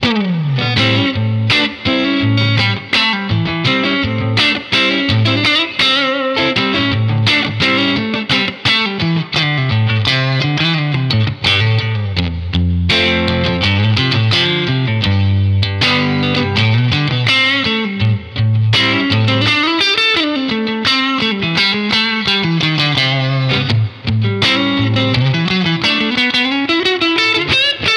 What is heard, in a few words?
Strat w Amp Drive